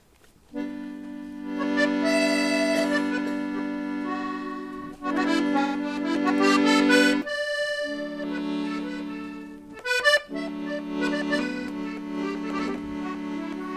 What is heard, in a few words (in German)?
drehleier; krimilesung